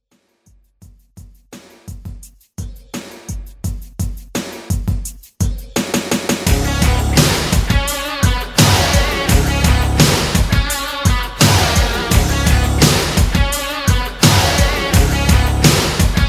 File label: 80s rock redrum